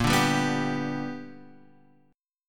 G Major Bb, chord; G/Bb strummed; JGuitar

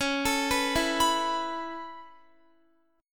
C sharp Minor 7th Sharp 5th C sharp Guitar; C#m7#5 arpeggiated

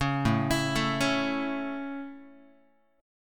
A Major, Guitar; arpeggiated

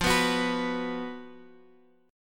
B 5th F, chord

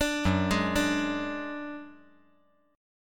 F sharp Suspended 2nd Sharp 5th; arpeggiated; JGuitar

